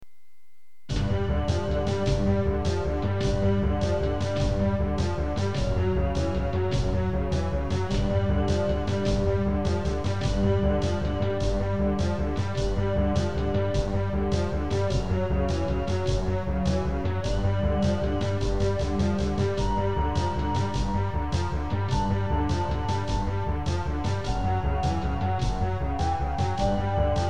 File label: Creative Sound Blaster AWE64 hardware; soundcardrecordings; Abuse